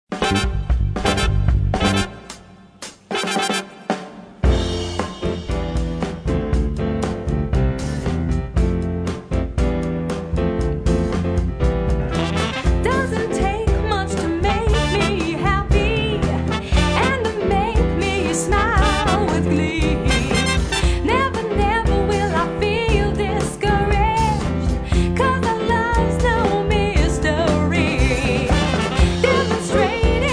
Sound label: vocals